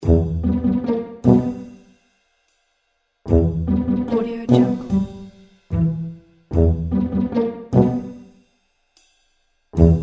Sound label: sneaky funny comedy